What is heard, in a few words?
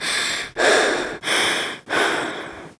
Realistic Fatigue Voice, female tempo; oblivion